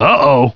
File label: movement; gearbox; gunman